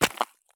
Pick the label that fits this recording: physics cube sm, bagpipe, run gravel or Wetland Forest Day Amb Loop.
run gravel